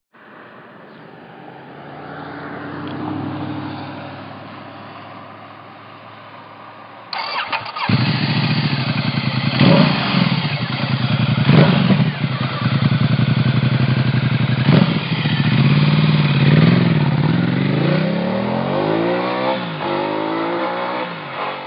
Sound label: XDiavel